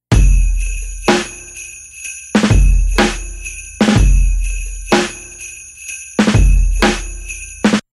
125 lo drum loop sleigh

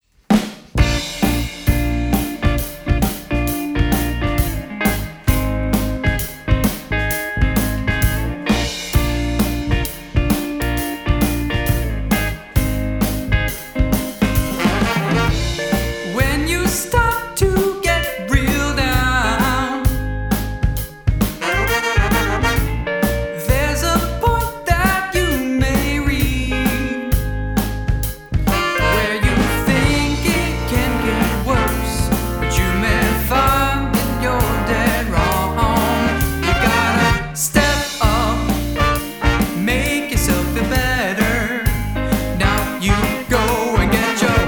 Close Harmonies

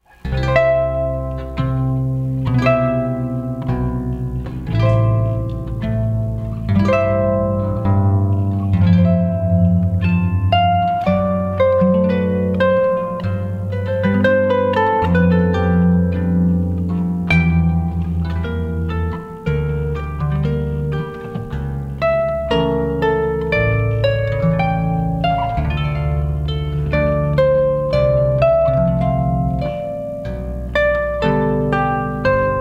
Air on a G String

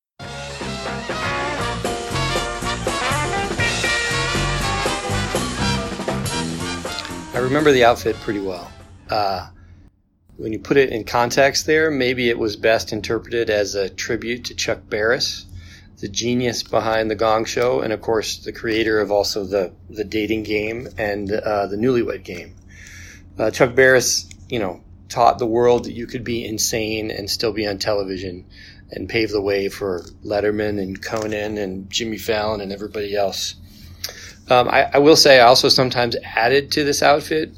Gong

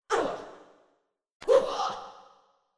Princess Heavy Damage VOX

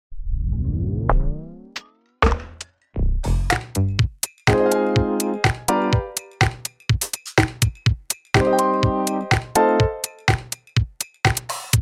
Bike Ride

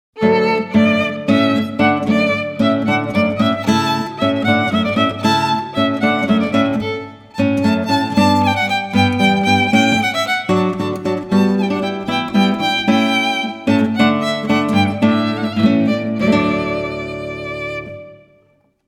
Hornpipe; Hornpipe from Water Music; Handel

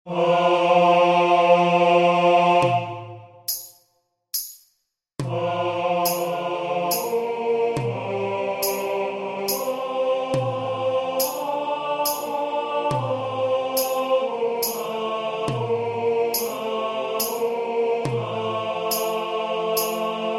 TENOR; My Country Tis of Thee

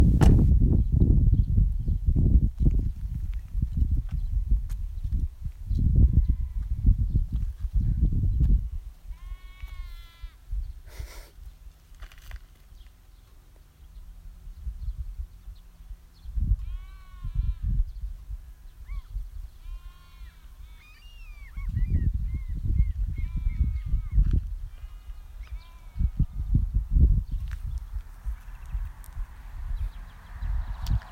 Just Outside Nornes

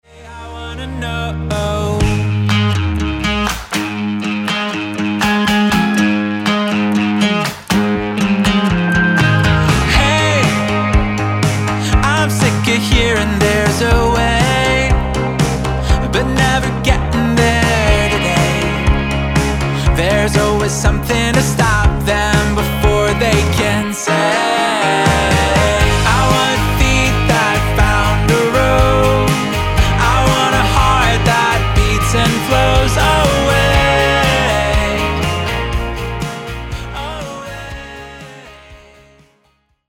DRUM BOUNCE

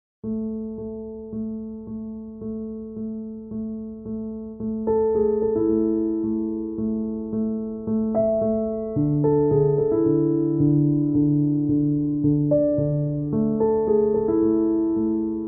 Chilled Ambient

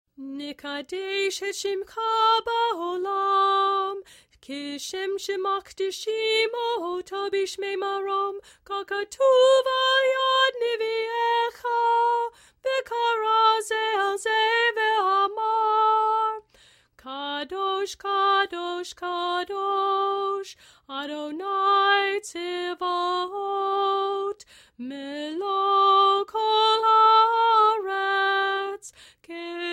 Kedushah sung

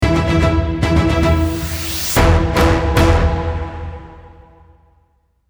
Sonic Logo; Gov Games

